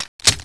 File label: p90 clip in; garrysmod; p90 smg